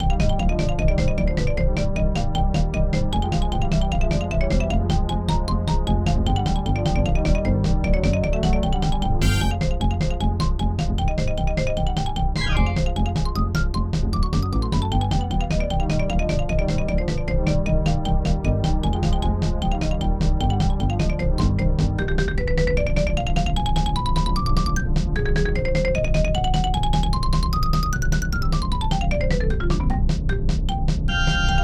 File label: Mine Cart Ride; Yogi Bear; SNES